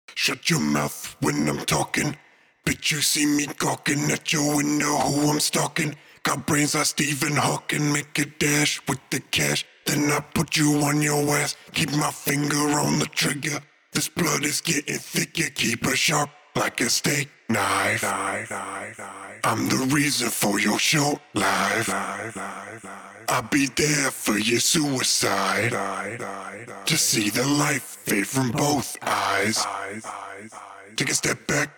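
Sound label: hip hop 125 D minor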